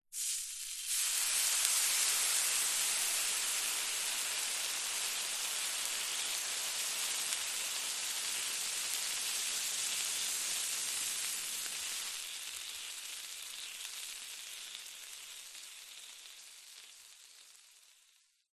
household water sizzle hot pan; zapsplat

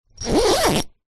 sleeping bag zipper; foley

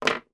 industrial paintbrush put down on table

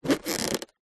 rubber squeak; foley; zapsplat

